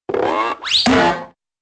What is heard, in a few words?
stretch yank and bonk